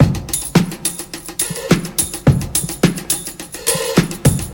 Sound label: 106 bpm drum loop f sharp key, kick tuned to the F# note. Loudest frequency: 3833Hz